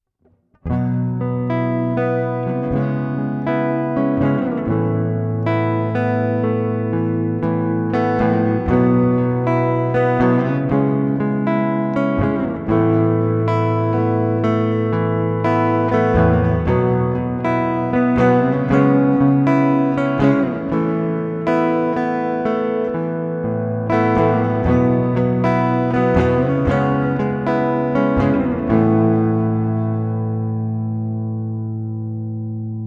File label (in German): e-gitarre akg bx 20 electric guitar wet